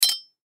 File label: Machete Hit Impact Sword; Knife; Knives; Combat; Warfare; Blastwave